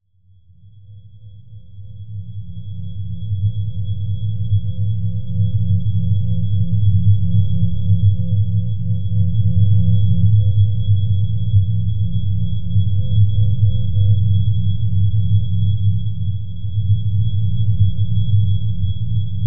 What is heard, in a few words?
sound design drone mysterious low frequency slight high frequency whine